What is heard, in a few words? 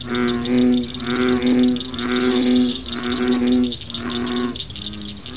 bull, biota